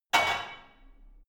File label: barbell only replace hard